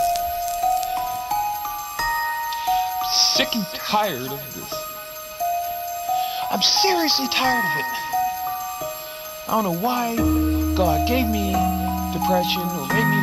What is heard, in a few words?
Huawei Y7 Pro 2019 Ringtone